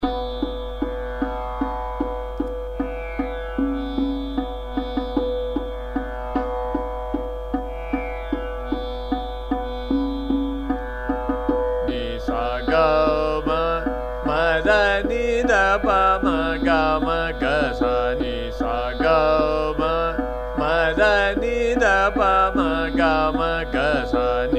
Sargam - Ni Sa Ga Ma Ma Dha Ni Dha - Trital, Jogkauns